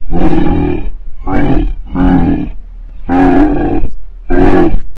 walk; Voices Gromila

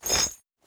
Chainmove

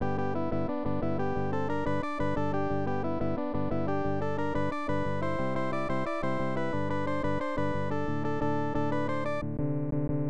Gambing (Roulette); Yuujin no Furi Furi Girls; snes

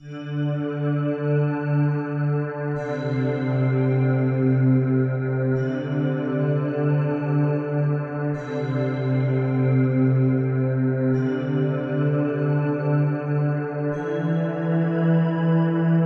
Cave Main

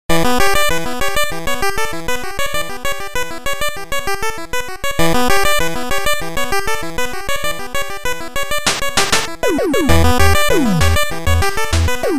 Suspense; Rockman 9

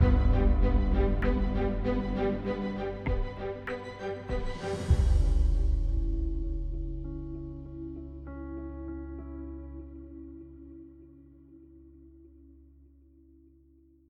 Level Complete

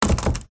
door locked

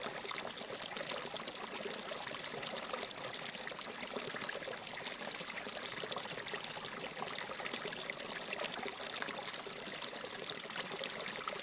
STREAM LOOP; The 39 Steps

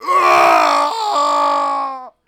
Male Fall Scream

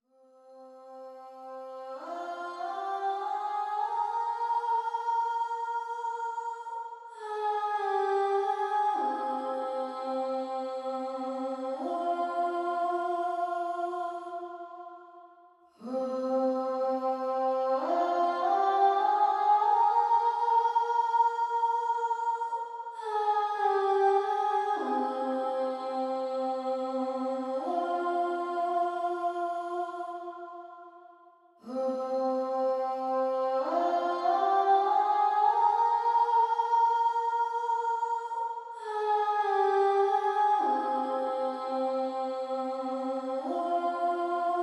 Distant Singing; fire emblem three houses gamerip

